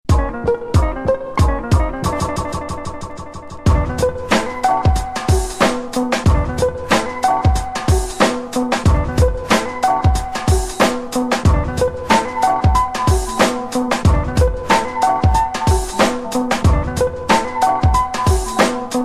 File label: Break